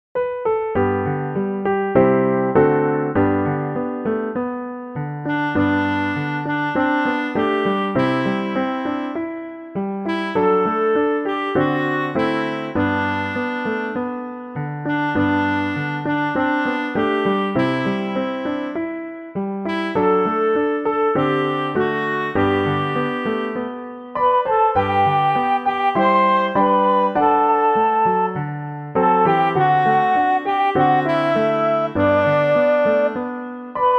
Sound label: instrumental